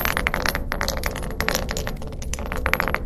metal grenade roll loop; physics

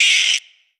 pain, headcrab, creatures; headcrab classic; vox

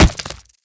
body impact, headcrab black movement

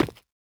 tile, footsteps

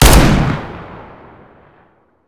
revolver, weapons; Counter Strike Model Pack; CS2

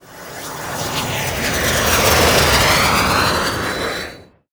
missile close; Counter Strike Model Pack; CS:GO; survival

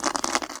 scurry; headcrab classic, movement; creatures

